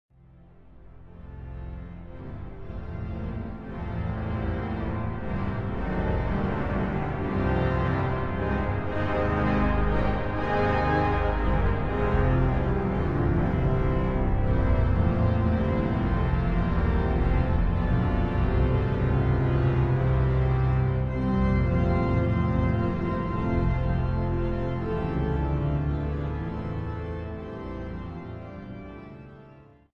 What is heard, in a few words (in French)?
Grand choeur